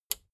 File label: plastic button small latch push